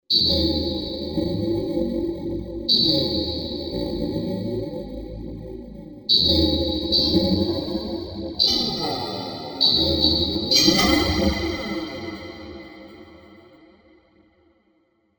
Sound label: Phone Wires